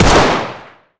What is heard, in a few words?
fire, glock; Urban-Coalition; Decay; arccw ud